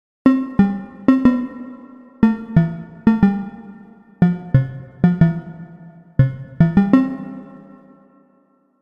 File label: Bell, synthlead